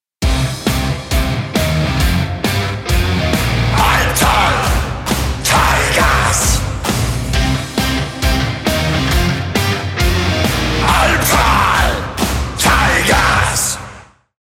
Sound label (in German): Klingelton; Tigers Song; Albtal Tigers